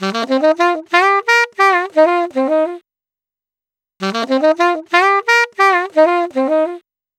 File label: Sax 120bpm Eb, Saxaphone; Quazibeat; African Secret